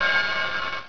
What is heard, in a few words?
Gothic close